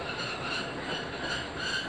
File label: rub, Train; OpenBVE